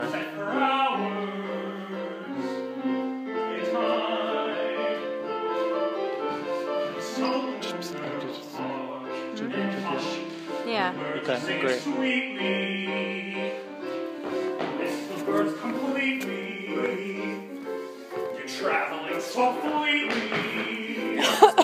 singing; Into the Woods rehearsal, around 9:00 pm, April 10th, 2014.

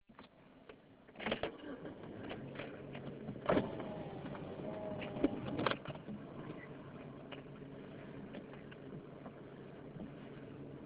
Driving With The Windows Down; spring

